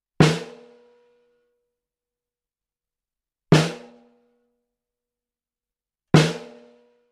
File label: Snare - R121 BiDirect; Royer Labs R121; bidirectional (osmička); edge duo srovnavaci test